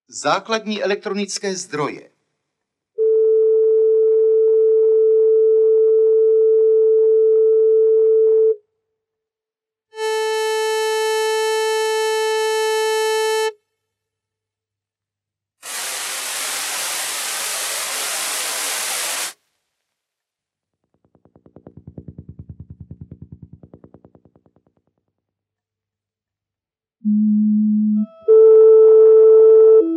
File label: sound examples, vocals and electronic noise; creel pone 7